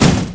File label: crash; SRALLY